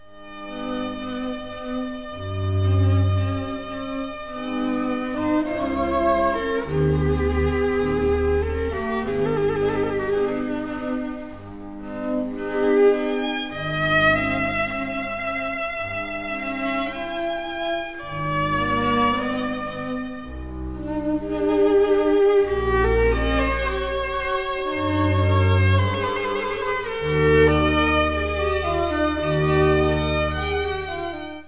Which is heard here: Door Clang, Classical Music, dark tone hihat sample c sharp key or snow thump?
Classical Music